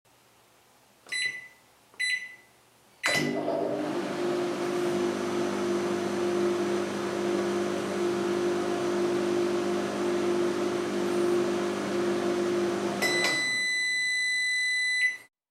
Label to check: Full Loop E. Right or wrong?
Wrong